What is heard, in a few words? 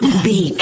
voice male hurt pain